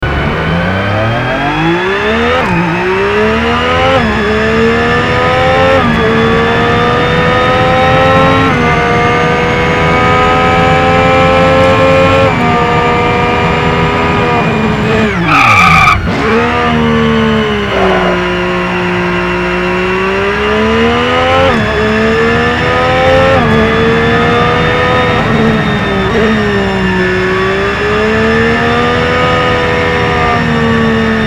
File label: BMW; race driver grid